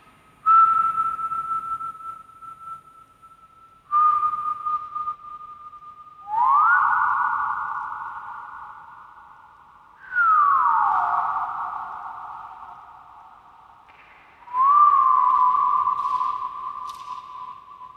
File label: whistles